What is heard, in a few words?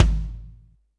KIK BIG; AMG - Now CD-ROM (Roland); K.L.B. 3; K.L.B. Kit 2